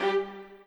strings

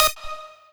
lead

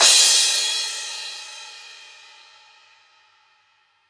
crash; osu

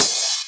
cymbal

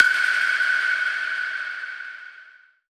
pluck; osu

